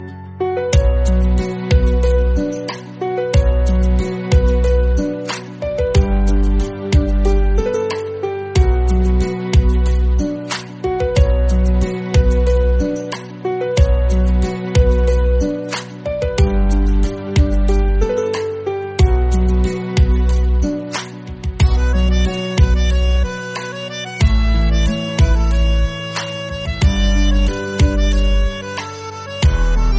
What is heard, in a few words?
Guitar, Bgm